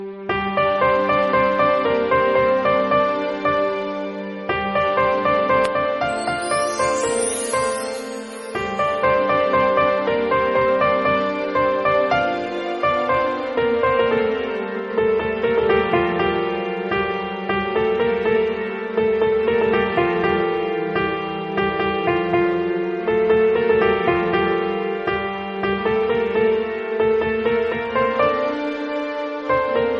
Piano

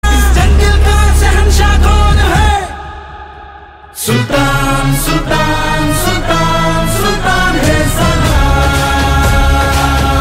Title Track Hindi Ringtone; Prabhas

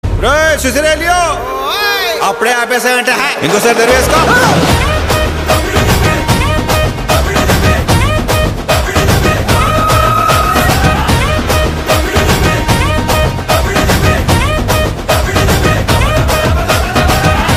Theme BGM Ringtone; Dhamaka Movie

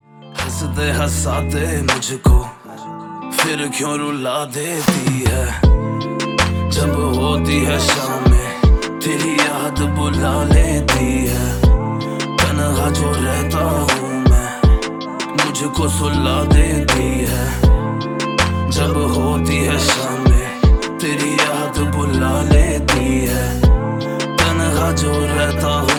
Song Ringtone

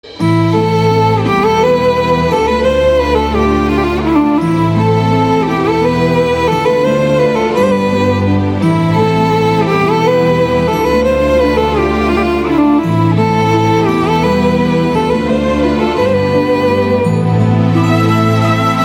BGM Ringtone

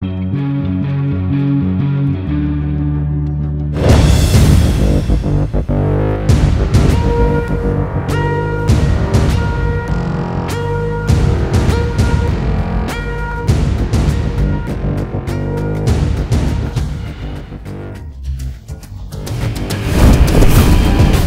THEME MUSIC RINGTONE; TIGER 3

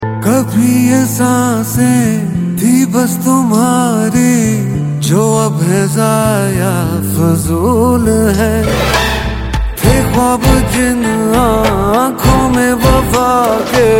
Song Tone

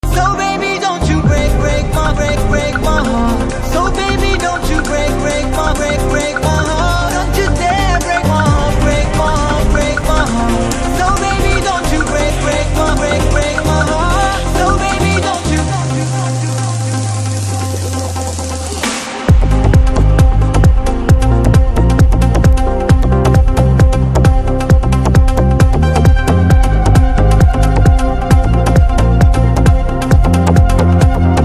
BGM Ringtone; Doctor

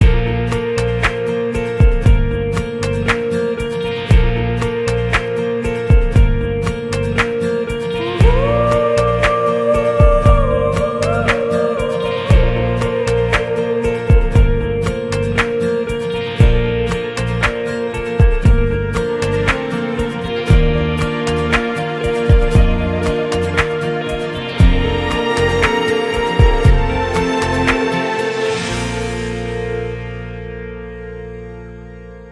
Instrumental

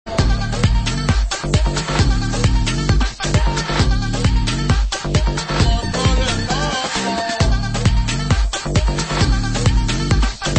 bgm; kolamavur

